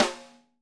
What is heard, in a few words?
snare, Roland MC-909